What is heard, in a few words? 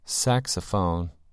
saxophone